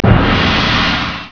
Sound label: Gong; Chimes Bells